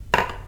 plate table

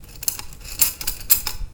spoon

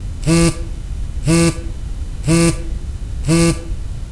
vibration

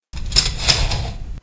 curtain close

